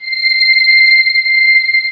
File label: Synth String C7; Star Trek JR Audio